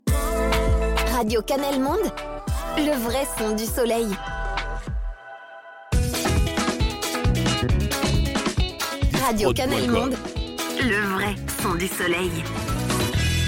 Radio Jingles; Radio Cannelle Monde

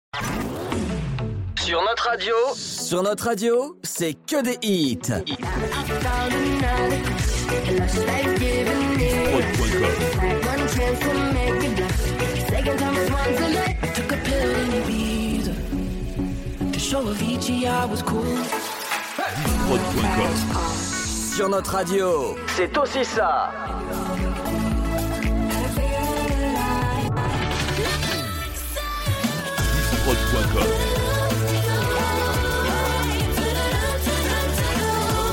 Radio Medley Hits

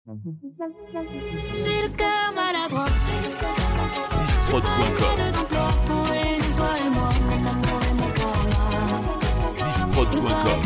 Medley Hits 85 BPM; Diffprod

